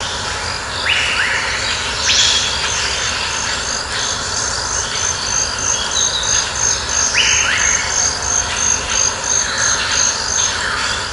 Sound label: Cave Animals Amb; gnarled forest